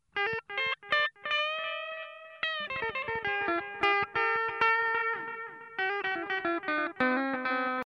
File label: Delay; DelayProcessor